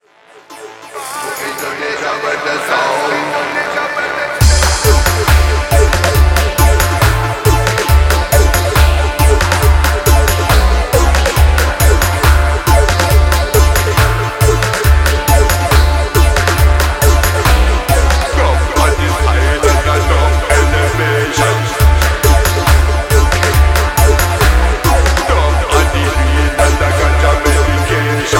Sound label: Heavy Dub Version